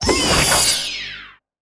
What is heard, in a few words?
umbrella death